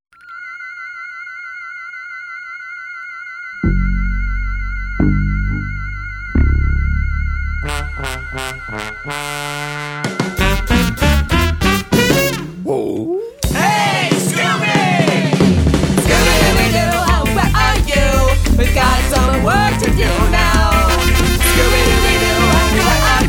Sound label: Punk Ska Covers, ska Cover